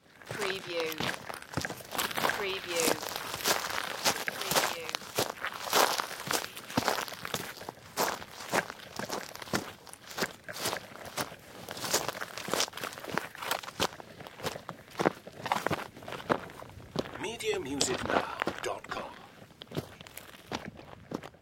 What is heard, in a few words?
Footsteps on Leaves